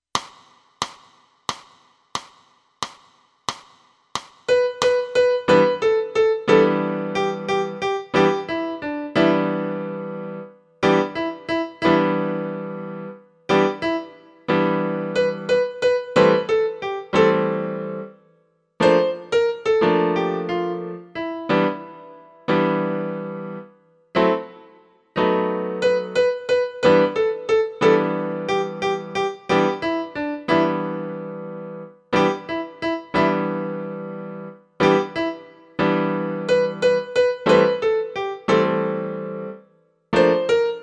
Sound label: piano